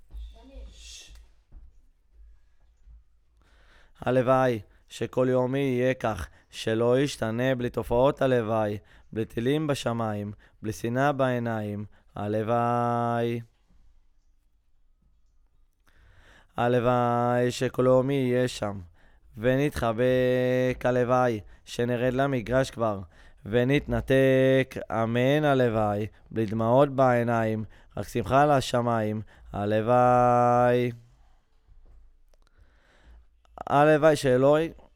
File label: VOC GUIDE